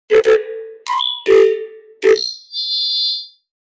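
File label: satisfying robotic prosthetic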